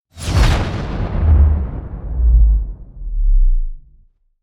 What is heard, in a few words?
Sub Sonic Bass; Fox Audio Post-Production; Mini Library